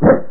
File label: Bark